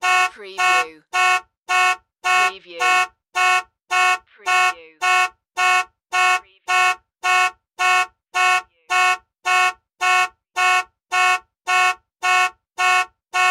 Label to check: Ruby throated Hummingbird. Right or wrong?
Wrong